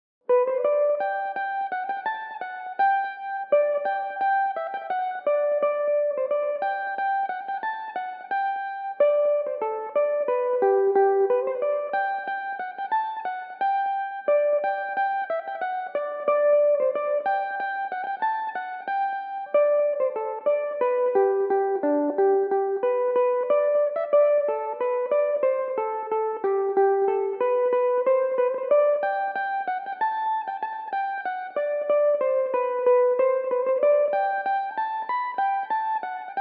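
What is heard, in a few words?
G major, Irish mandolin; ill mend your pots and kettles o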